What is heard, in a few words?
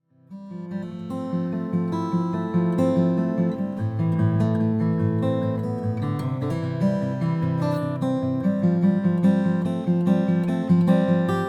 santa cruz om cocobolo